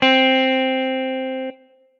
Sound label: Guitar Dual Amped Crunch; Guitar and Bass; Ableton; Factory Packs